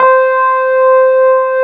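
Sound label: Wurlitzer C4 - R; USB Soundscan vol.09; AKAI; Keyboards Old School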